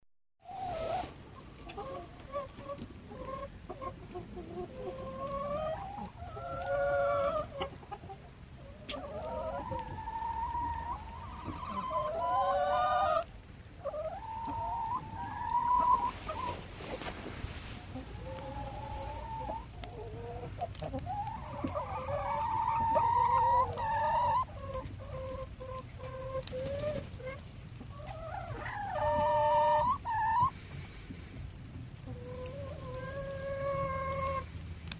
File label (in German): Zufriedene Huehner singen